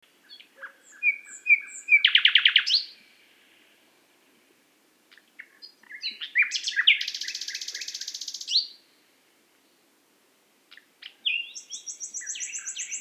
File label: nightingale; qatar